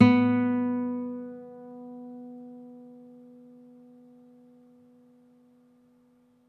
Open, Classical Guitar

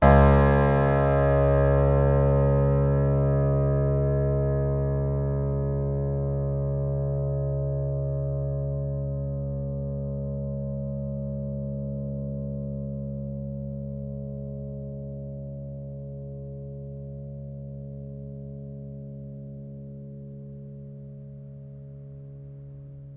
String Pluck, Piano; Piano Miscellaneous

